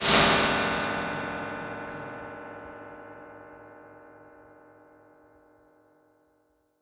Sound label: suspenseful gong hit; soundscrate